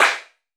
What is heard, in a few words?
CLAP, GM PERC KIT; AKAI S-Series CD-ROM Sound Library VOL-5